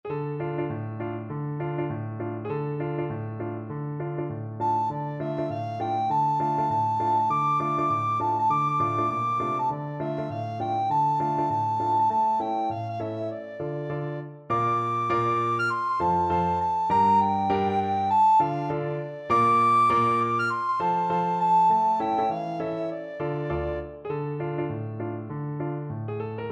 recorder

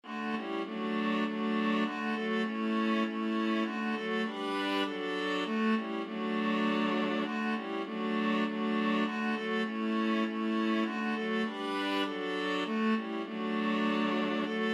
come thou fount viola trio

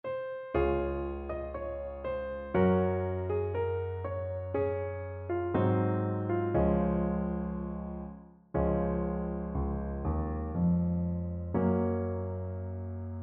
trumpet

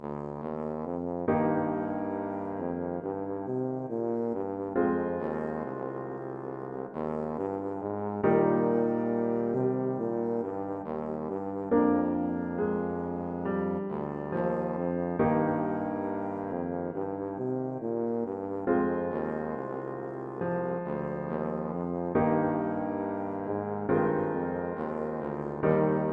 danny boy, tuba

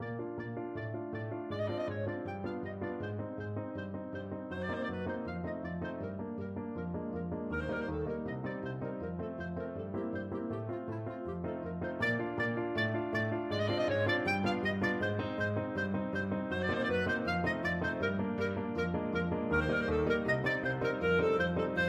carnival finale, clarinet

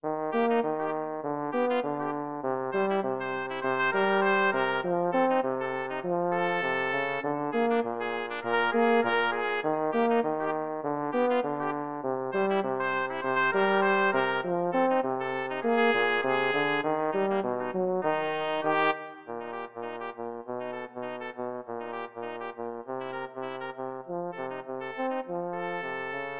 trumpet french horn duet; silver sand rag

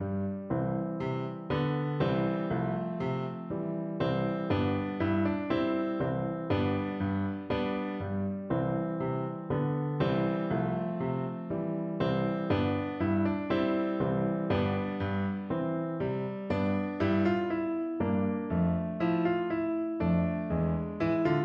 PNO

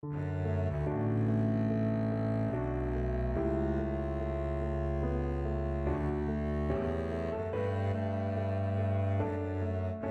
double bass

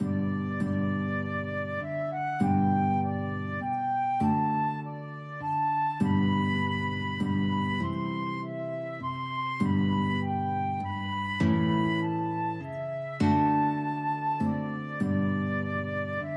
flute guitar, guitar flute duet

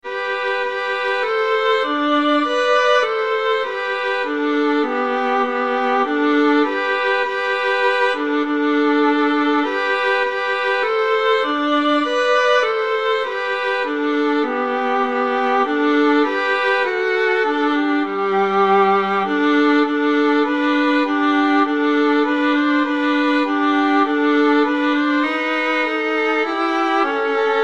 ode to joy, violin viola duet

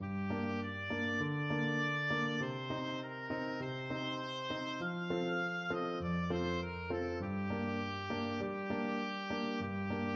horses, oboe

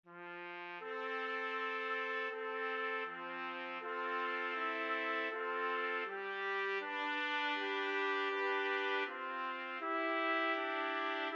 trumpet trio

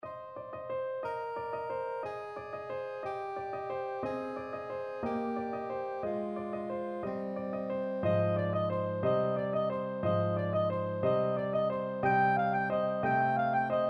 carol of the bells, soprano saxophone

